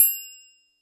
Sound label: kit, Yamaha Motif-ES8